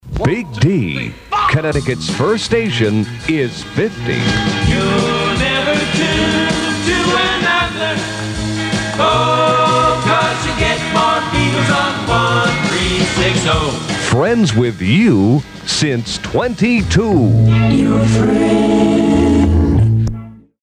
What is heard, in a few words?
jingle